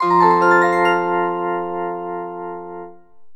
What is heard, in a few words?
item get; Kirei Zukin Seikatsu 2; NDS